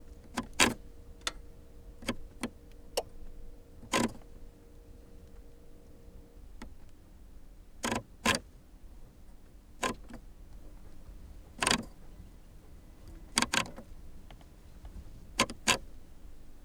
VW Golf Diesel Dial for Temperature and Fan Speed; Golf Variant TDI 2019; MS Decoded

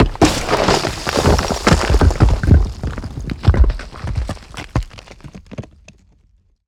building collapse debris falling rock rubble; BluezoneCorp

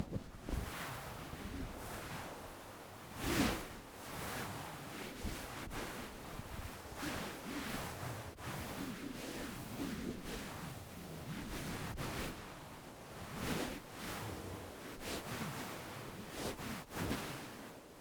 PILLOW SOFA MOVEMENT HANDLING SKIM STROKE; Cloth Foley; The Soundcatcher

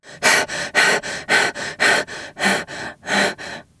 Voices Female Breathing Heavy; Funky Rustic; FunkyVoices

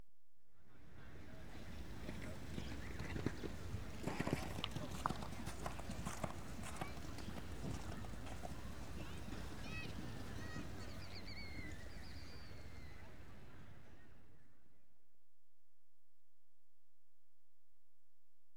Show Jumping, Horse Jumps, Equestrian Events; BBC Sound Effects Library

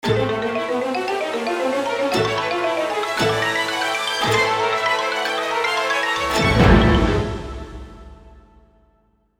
Orchestral Phrase; FXHome

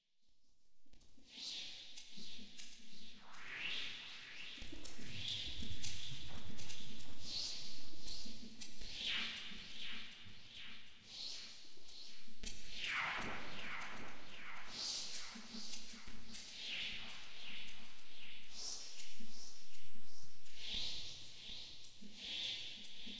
Magnetic Field; 99Sounds; Magnetic Fields

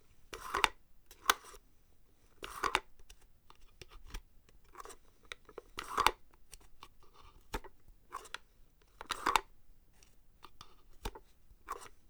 Antique Grinder Wood Drawer; Antique Grinders; The Soundcatcher

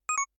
power up; Kenney's Sound Pack; Digital Audio

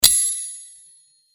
Sword Sound; FXHome; Fighting Sounds

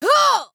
Voices Female Grunt Attack Heavy; Funky Rustic; FunkyVoices

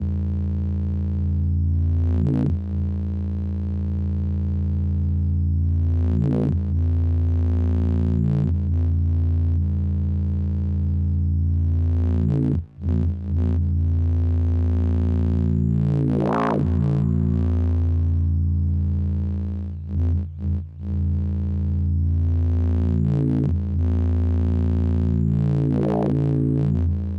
E Bass; Federico Soler Fernandez; Complex Cinematic Tension Sounds

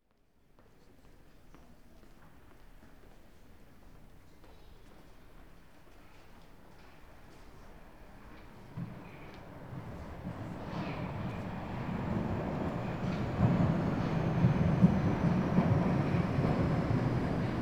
Austria, Platform, Schwedenplatz; European Soundscapes; BBC Sound Effects Library

